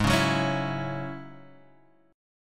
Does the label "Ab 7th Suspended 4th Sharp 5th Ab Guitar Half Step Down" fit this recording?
Yes